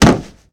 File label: Body Fall On Wood, Hand To Hand Combat; Sony Pictures Sound Effects Series